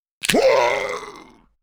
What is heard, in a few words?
Voices Zombie Hit Groans; gamerip; Out of Reach: Treasure Royale